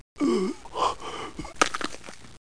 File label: neck snap